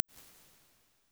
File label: Medium Hall; Medium Spaces; Halls; Impulse Responses; Apple